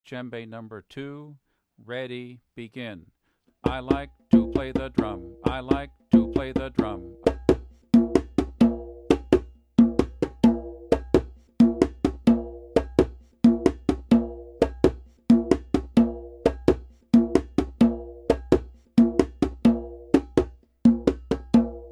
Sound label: Aconcon Djembe